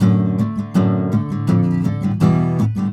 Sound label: AM GTR, Celtic Flavours; USB Soundscan vol.42; AKAI